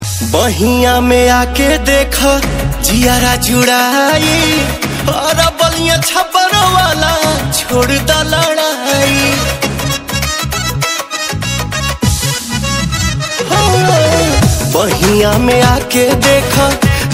Song

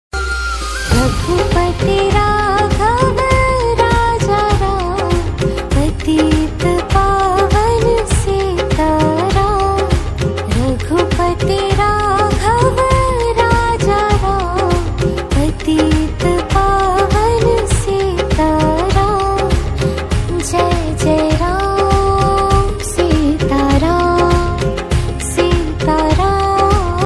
Ram Bhajan

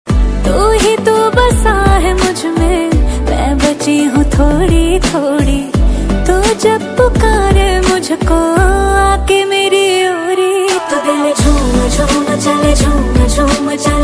Female version

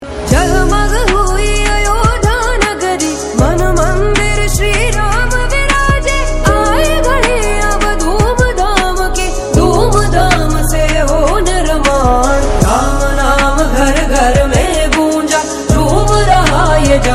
Ram Bhajan

